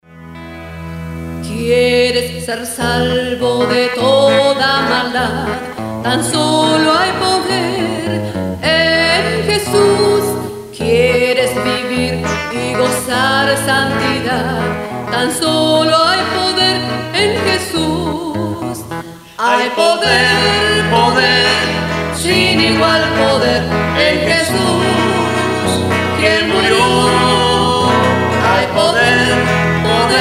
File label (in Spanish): himnos